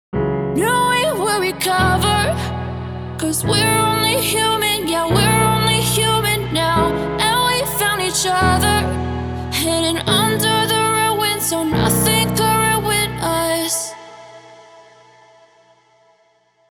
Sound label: Vocal Chords